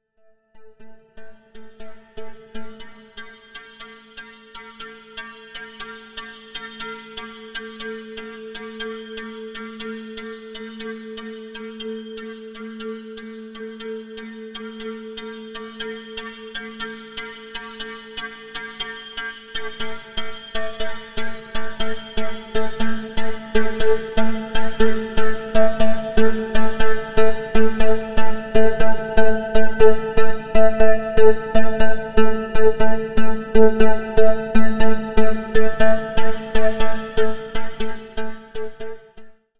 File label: Perc Synth